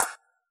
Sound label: Analog Claps; Yamaha DJX; DJX Kit